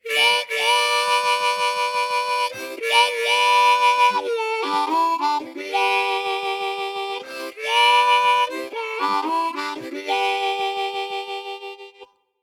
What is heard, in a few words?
Lonesome Whistle, Blues Harp